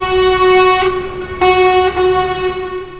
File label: truck signal